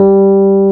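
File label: piano G2 p 73, Rhodes suitcase; Keyboards of The 60's and 70's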